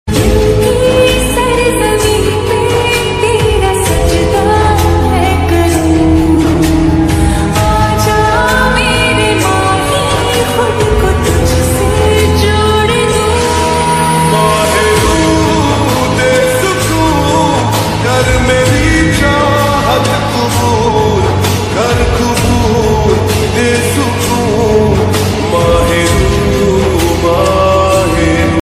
music ringtone hindi viral lovers ringtone beautiful love